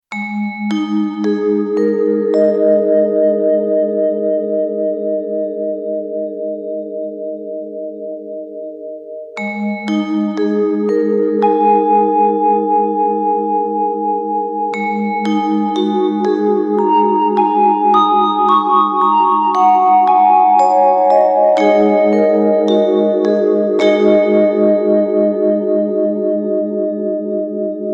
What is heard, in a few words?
Mk I Vibes vibrato